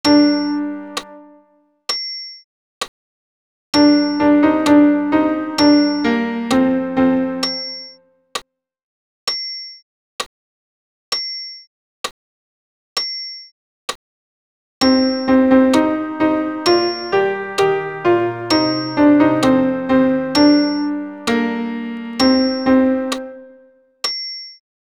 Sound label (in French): Gloria ten pian acc